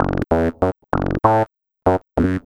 Squibble, Bass; Sonic Foundry (Sony Creative Software); Synchro Funk

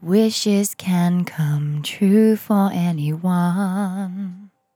On A Star 65bpm F, Vocal; Zero-G

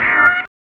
HIT, Hip Hop and R&B; Best Service ProSamples vol.01; AKAI

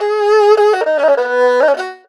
ERHU LICKS

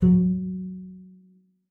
F#3, bass pizz; Xsample VOL-5

